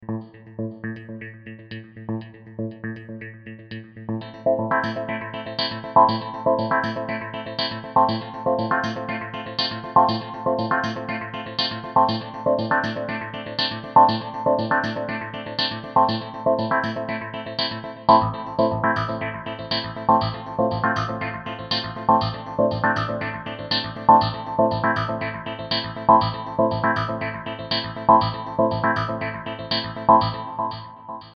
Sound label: Wah My Sequenz